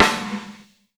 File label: Snare (process), Live Kit; Sonic Foundry (Sony Creative Software); Ugly Remnants VOL-2; Single Shots; Beatstuff